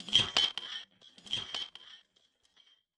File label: bang